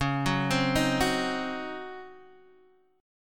Db Major 7th Suspended 2nd Suspended 4th Db down Guitar Open G